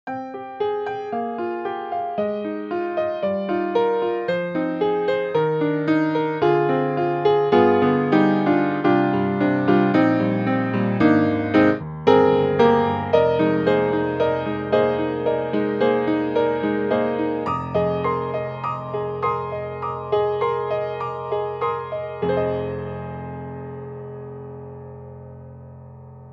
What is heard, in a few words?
Keys Modern Upright Front Stereo; XLN Audio; Addictive Keys